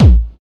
raw kick single shot a sharp key, Royality free kick sound tuned to the A# note; kick drum samples